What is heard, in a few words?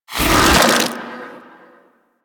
Pelt Shed Deactivate; Warframe; Warframes; Dragon